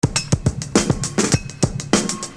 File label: Beat, Hip-Hop Street Beats